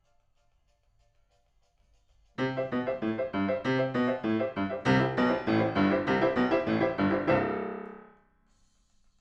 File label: PIANO